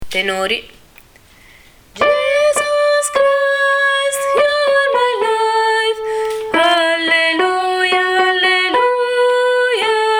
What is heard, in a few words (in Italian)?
tenori